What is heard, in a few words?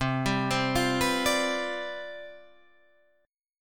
C Major 7th Suspended 2nd Suspended 4th, Guitar Drop D, chord